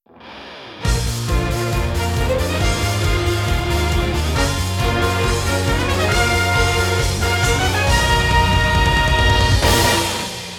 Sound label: Victory Dance; Hyrule Warriors Definitive Edition